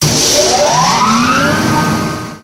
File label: motor start; digistuff nbextras